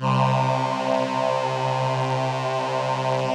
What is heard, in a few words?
VP-330, String Machines